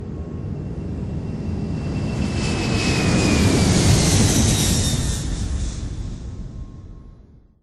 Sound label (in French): Airbus decollage